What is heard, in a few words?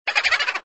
Boo Laugh